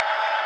noise harmonic hit; Weird and Interesting Hits

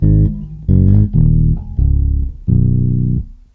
Fret bass lick

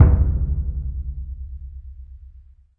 big burundi, African Burundi Drums; ETHNIC and WORLD PERCUSSION

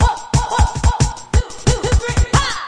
180 bpm, drum loops and breaks; 161 to 180 bpm

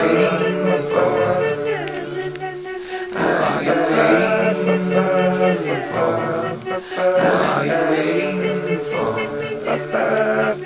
120 vocals, Folksy Voice; remixable collections; Folksy Guitar and Voice Stems

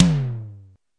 hi e tom, Toms

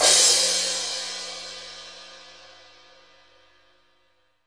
Z15 Crash A3 Stk1 ff; REAL LIVE KITS

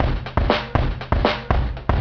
110 bpm, lofi action on the kick

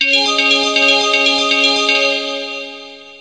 Gamelan; WORLD

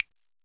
Thumbs, classic hip hop; STYLE KITS; Classic Hip Hop Mega Kit

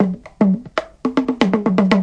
118 bpm talking drum break

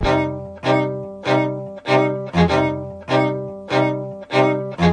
097 schubert hip hop strings

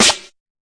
fx drum, Electro FX

